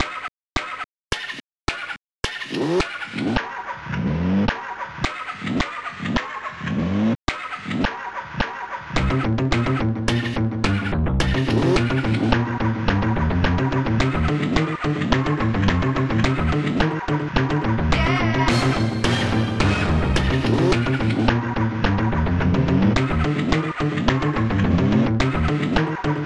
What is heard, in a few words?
art noise